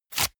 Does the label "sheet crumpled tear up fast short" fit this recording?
Yes